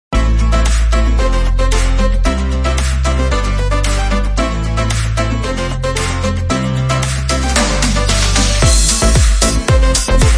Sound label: Dance azure world summer tropical house background music